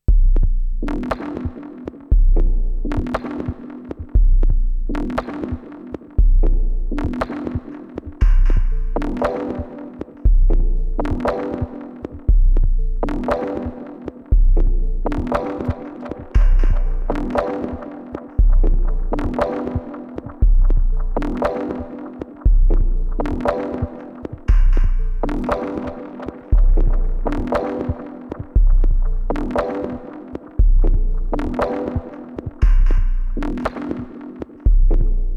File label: Roland TR 8S Pattern